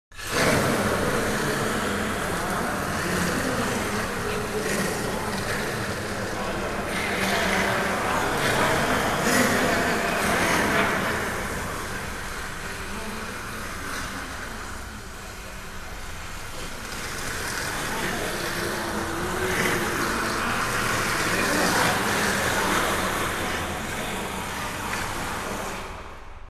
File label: scream; Animal Snarls; RSVP VOICES; Audio Network